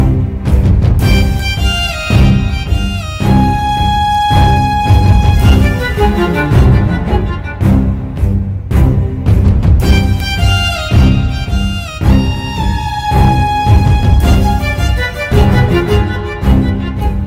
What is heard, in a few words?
Heart Touching Sad Bgm Ringtone; Bahubali 2